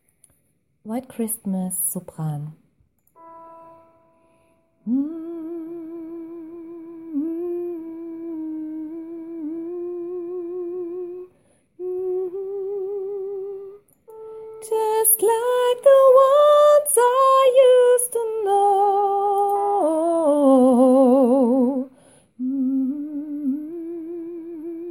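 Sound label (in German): sopran, gospel choir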